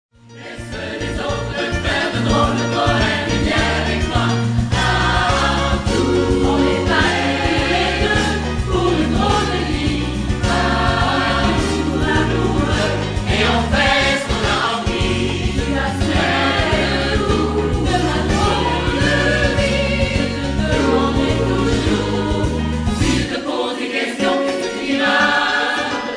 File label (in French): SATB